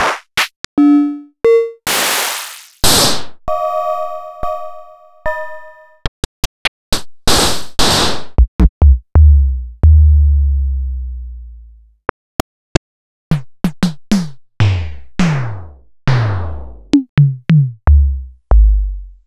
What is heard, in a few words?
Wiard 300-Series Modular